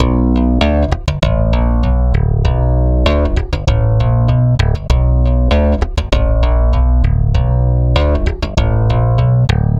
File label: Stick Loop, Stick Bass